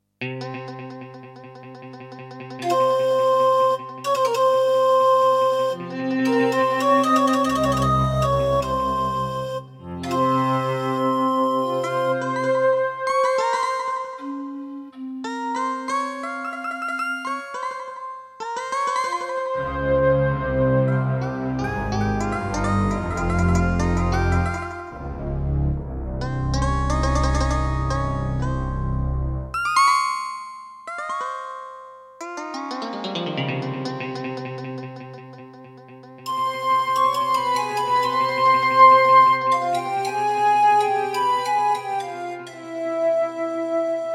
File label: Serdaco Dreamblaster S1; Prince of Persia 2 - Intro